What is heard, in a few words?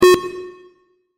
count tone; SendTrain